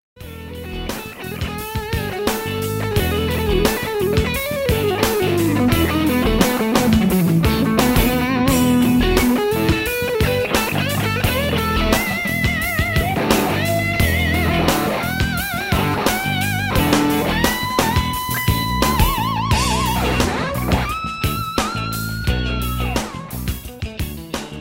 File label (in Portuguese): Distorção, guitar